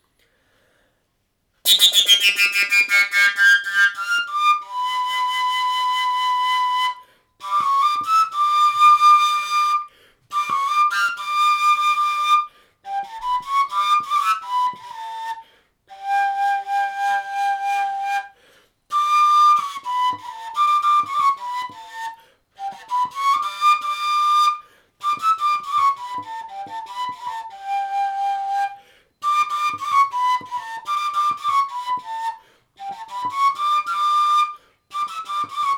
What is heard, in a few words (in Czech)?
Koncovka G